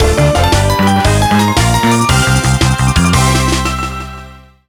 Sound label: arranged win, short melodies